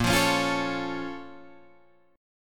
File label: Bb Minor Major 7th, Guitar, Open G